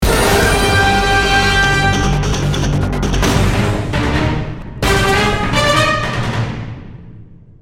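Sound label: Music Combat; Mx Unitology Combat Trans A 2 B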